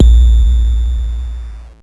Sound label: BD, Mutable Instruments Anushri